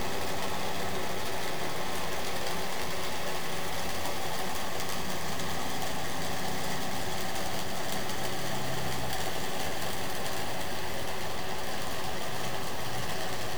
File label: Noise